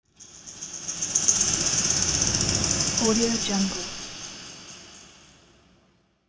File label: sci fi machine dark resonator rattle dynamic; futuristic sounds